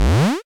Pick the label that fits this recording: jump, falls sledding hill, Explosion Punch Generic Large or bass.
jump